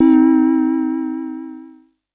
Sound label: SYNTH Mini Sensual Church Bell